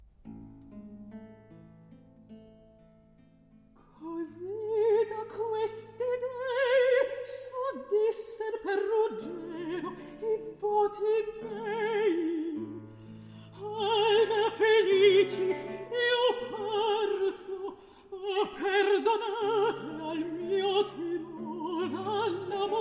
Recitativo; Orlando Furioso, RV 728, Act II, Scene 11; Vivaldi; Jean-christophe Spinosi

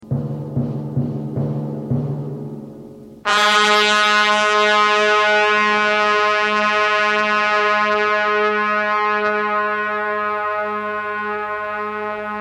Horn

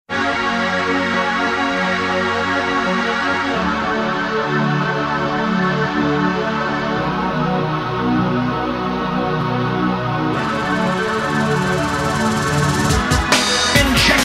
Synth

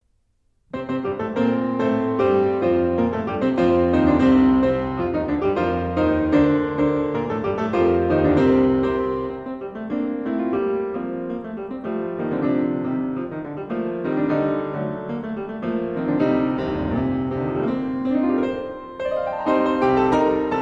Old Dance Tunes Allegro, Piano; 15 Hungarian Peasant Songs for Piano, Sz. 71 (BB79); Bartók

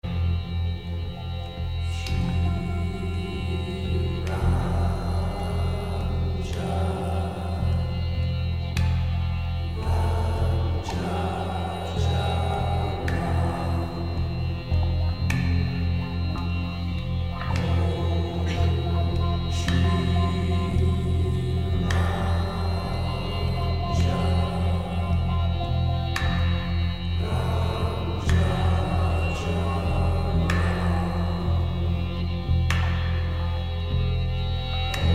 loop Vocal Mantras

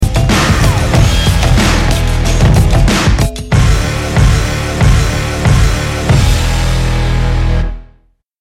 Guitar

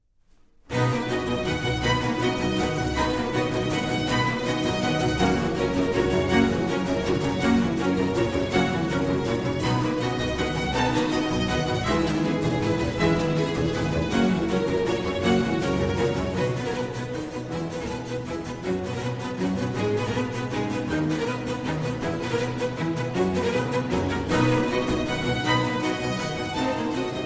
Concerto for Strings in C Major, Allegro; Vivaldi

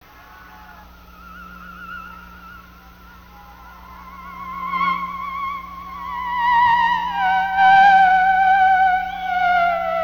Keyboard Improv Drum Solo Live

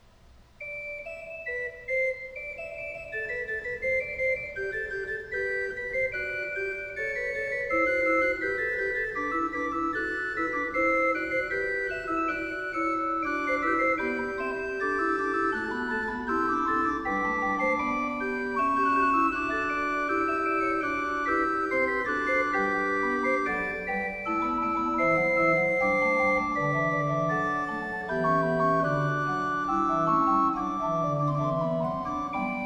Fugue, Organ; Magnificat octavi toni; Johann Pachelbel; Complete Organ Works

